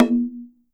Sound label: TASHA, ETH PERC; WorldSounds